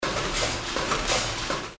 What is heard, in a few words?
candies filling machine